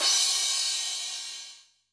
Crash, drum

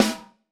Snr, drum

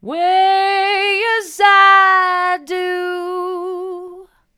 way as i do hard, vocal

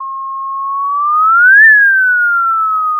Sound of a police car siren

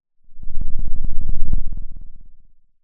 Sound of a lion’s roar

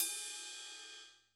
Ride; drum

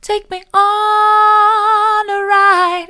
take me on a ride soft, vocal

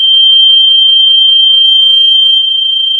Sound of a tea kettle whistling

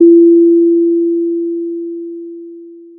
pop, Sound of a doorbell

